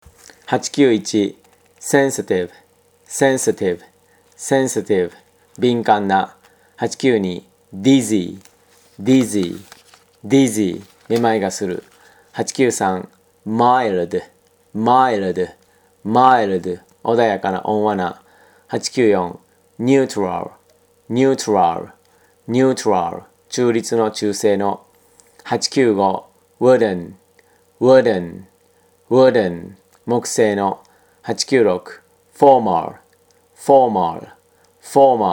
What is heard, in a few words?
tango